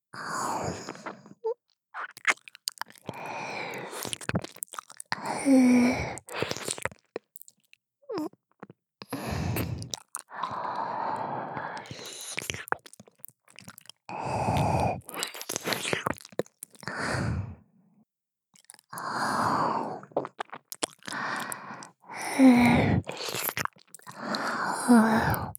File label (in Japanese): 左耳舐め音のみ